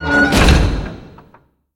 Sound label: palace door close; Fate The Cursed King